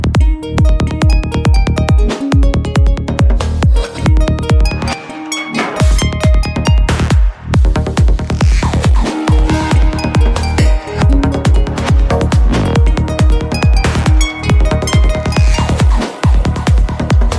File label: lg w10 ringtone